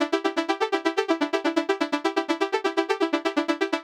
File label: 125 Blipp E, Keys, Nu Jazz House; PowerFX - Nu Jazz House